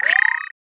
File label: Scope